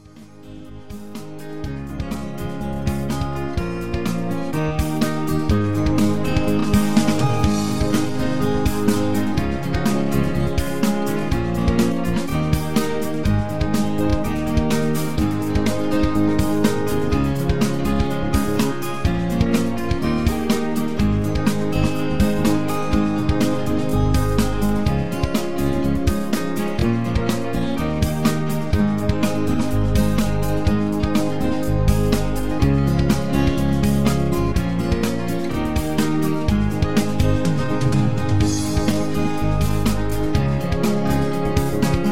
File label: Instrumental Higher